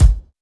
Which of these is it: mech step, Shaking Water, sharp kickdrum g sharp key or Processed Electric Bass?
sharp kickdrum g sharp key